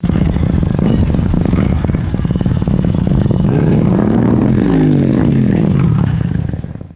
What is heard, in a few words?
distant motorcycle engine accelerating; vehicles